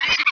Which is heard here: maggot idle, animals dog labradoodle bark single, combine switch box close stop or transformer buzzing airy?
maggot idle